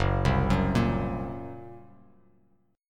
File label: Gb Major Flat 5th, down, Bass Standard; arpeggiated; JGuitar